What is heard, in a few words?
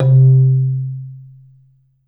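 Marimba, soft bass, C3; Sampleheads - Dave Samuels Marimba & Vibes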